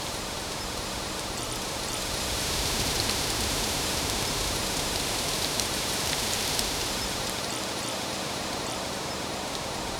soft wind on a barren, hill

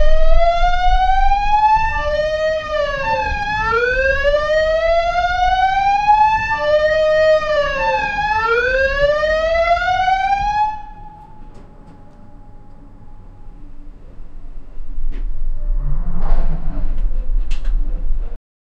muffled alarm siren; emergency

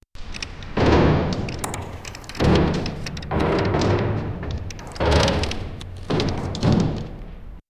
a robot walking in

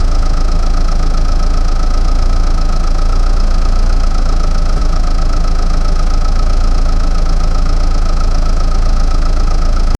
car engine idling with a, low rpm

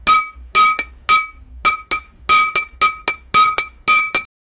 dumbbell, gym

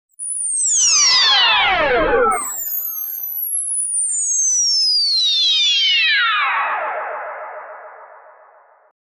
energy shield damage

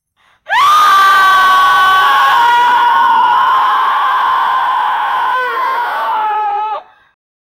short sharp scream of surprise; fear

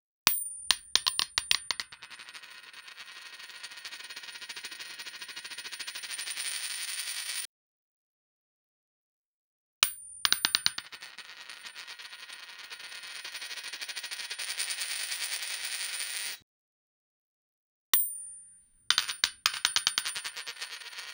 coin sound effect

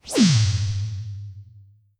transition

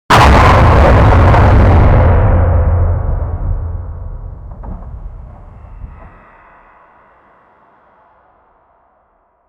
the sounds of a hellish, dungeon

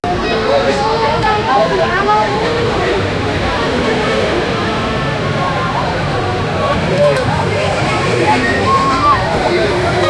crowd in amusement park

